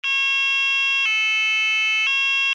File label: alarm tone, sounder alarm tone; sounder beacon